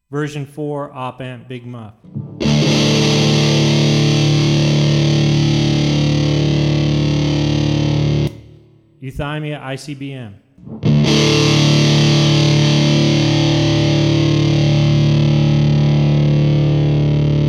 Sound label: V4 Big Muff SUF OpAmp Dropped D